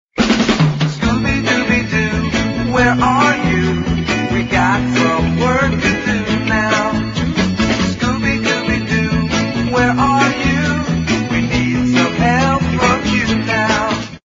childish ringtone

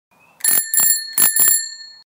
Cycle Bell

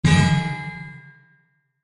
Anvil

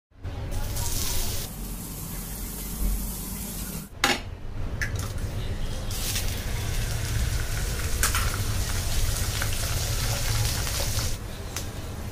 fried egg

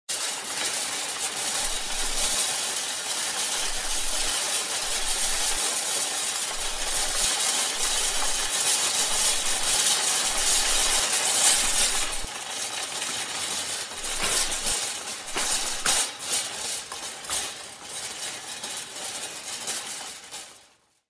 shopping cart

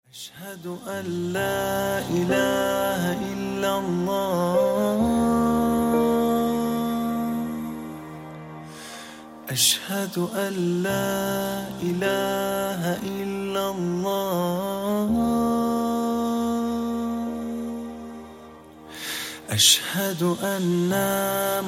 prayer ringtone

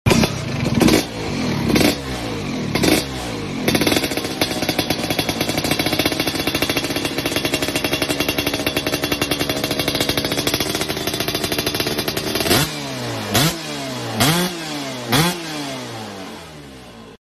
Vespa

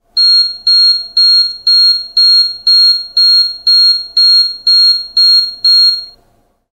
Seat belt horn

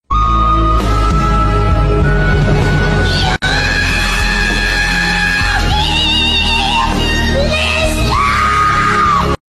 scream

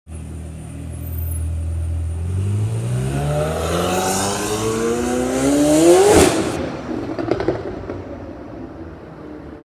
McLaren

